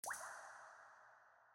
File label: drips, injection; Dystopia